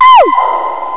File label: "OUH"